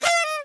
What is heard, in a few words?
Squeek; Five Nights at Candys